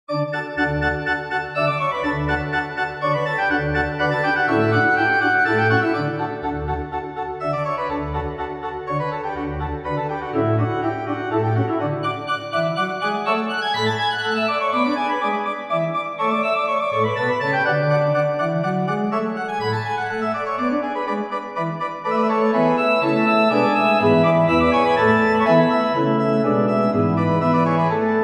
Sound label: allegro for piano organ transcription